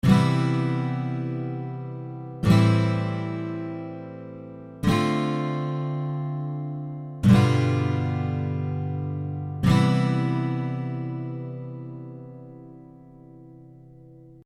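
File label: Guitar 7th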